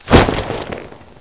jump on

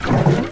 glug

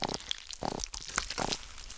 splauncher idle

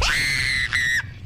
koro scream; korone

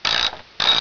bomb part

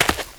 dirt, footsteps

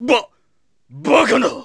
death

